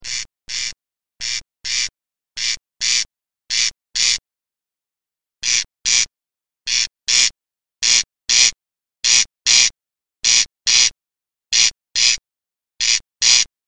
Re di quaglie

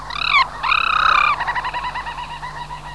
Gazza marina minore

